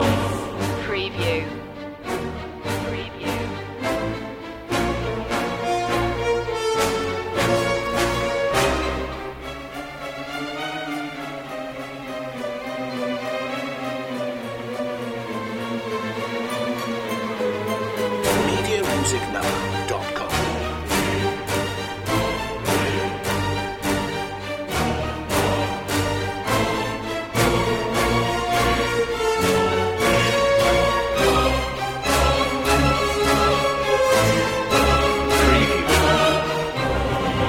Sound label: no perc